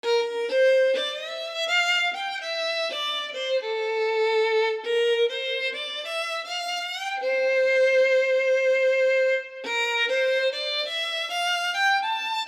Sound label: violin